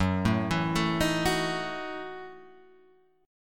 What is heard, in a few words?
F sharp Minor 13th, Guitar, Open G